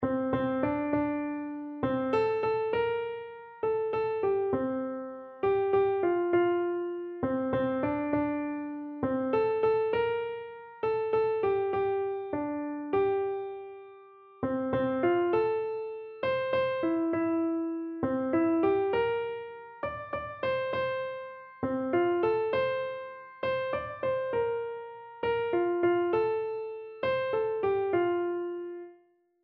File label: in loving kindness, keyboard